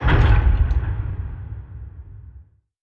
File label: submarines engines; World Of Warships